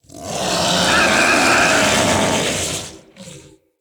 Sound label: Ancient Shell Player Spotted Scream, Infested; Enemies